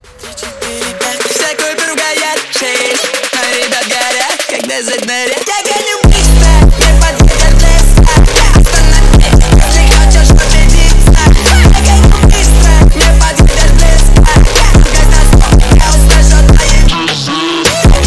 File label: bass boosted remix